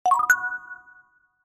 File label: Realme SMS; Realme Narzo 60X